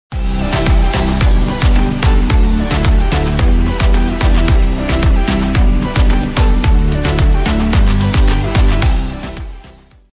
snapchat ringtone; snapchat notification; android

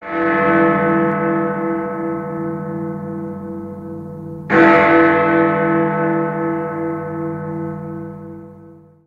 big ben ringtone

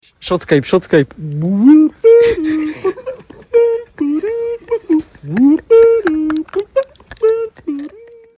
skype ringtone

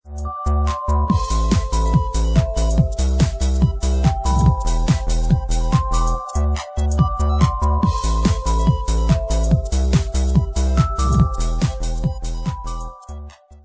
music ringtone, motorola

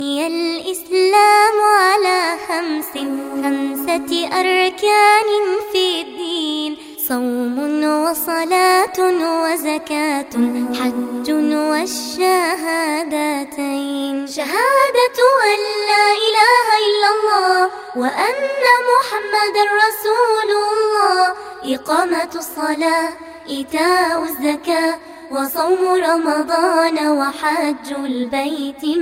arabic naat ringtone; android

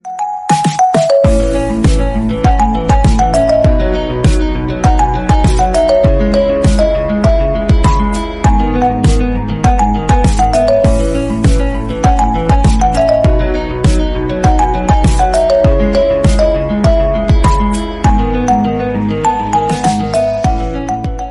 iphone new ringtone

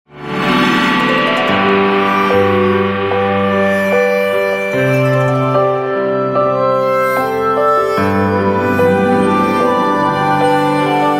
emotional orchestra ringtone

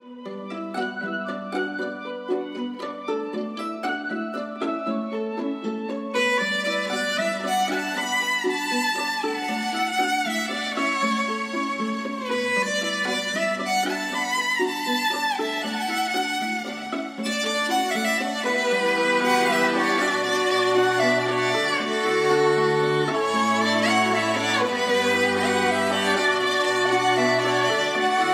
violin ringtone; android

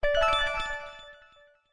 crystal piano ringtone; xiaomi notification; android

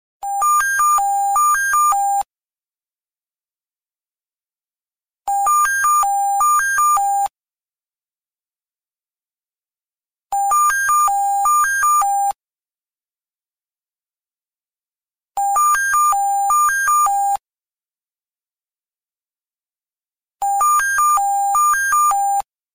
nextel ring ringtone

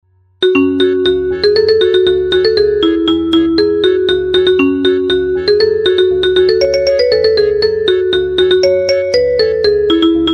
instrumental music ringtone

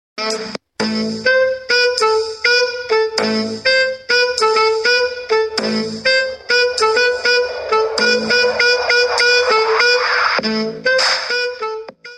snapchat ringtone; snapchat notification; android

